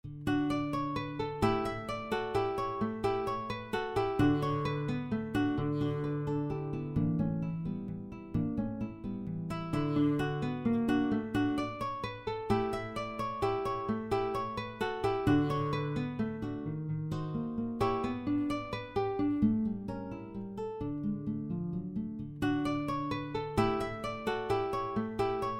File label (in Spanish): Vals Venezolano, midi